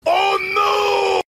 tone

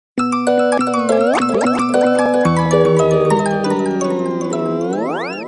nokia notif

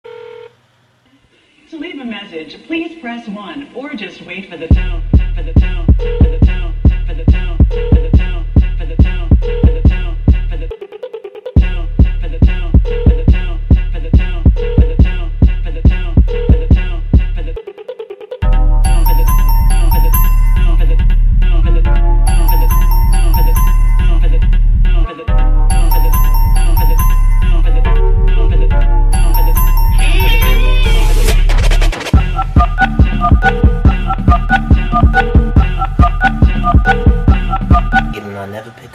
phone ringtone song

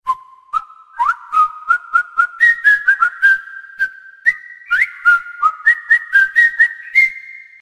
Whistle; Galaxy

